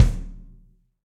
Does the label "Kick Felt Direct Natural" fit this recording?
Yes